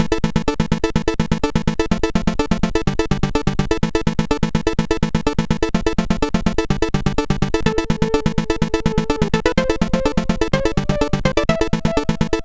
Introtune, MOS6581R4

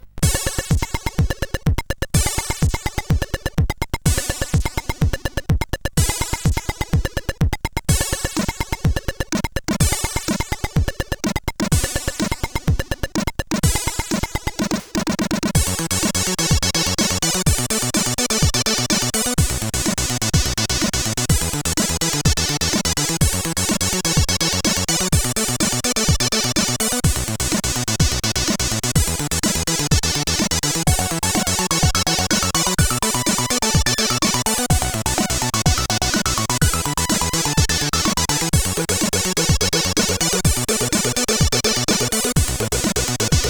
CSG8580

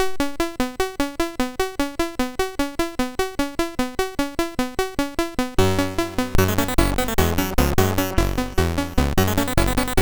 Fourteen Beat, MOS6581R3, SIDwave

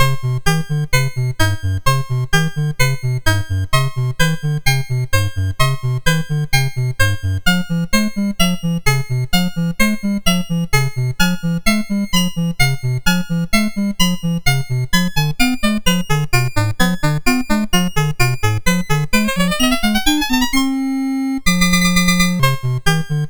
sid MOS6581R4, GAMES; Accolades Comics

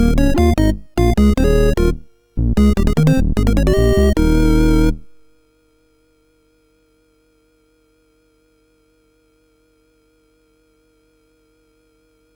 Game Music, MOS6581R4

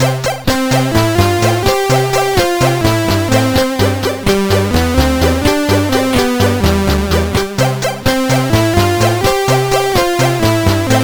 Music Samples, sid, CSG8580R5

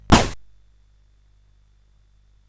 Dark Castle, CSG8580R5

